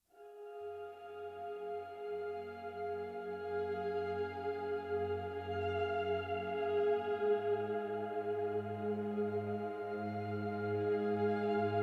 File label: guitar chill out relaxing spa music